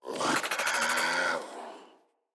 Infested Charger Idle

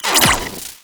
Split Laser Retract; Warframe; Corpus

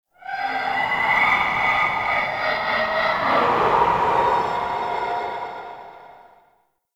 Citrine Void Connector Shriek; Warframe; Citrine's Last Wish

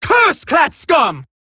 attack, female; Warframe; Eximus Grineer Female; Battle Barks

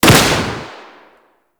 Pistol Shot; Warframe; Sapient

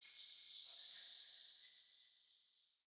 alley distant chains; Ambience; Under City

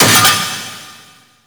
Vaults Stake Gate; Gameplay; Warframe; Entrati

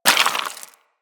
Bullet Impact Debris Rock

